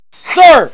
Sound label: gate